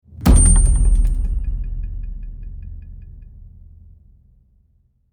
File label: Action stinger